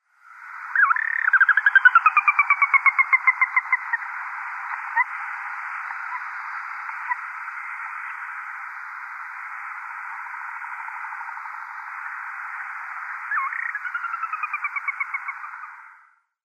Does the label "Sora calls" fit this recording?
Yes